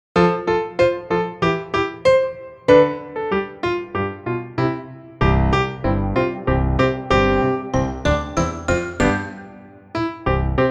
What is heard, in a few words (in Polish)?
wersja instrumentalna; EduMuzArte